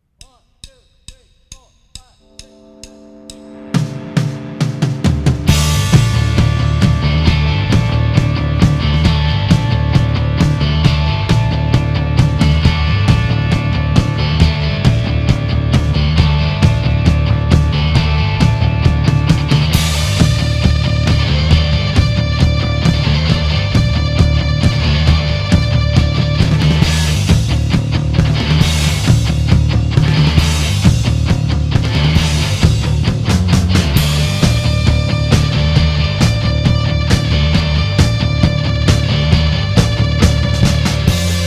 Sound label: Train, Instrumental